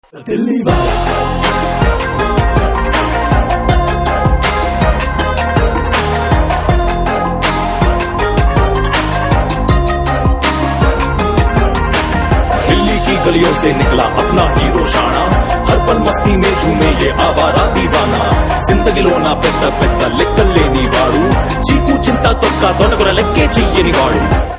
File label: Telugu Ringtones and BGM; Disco Raja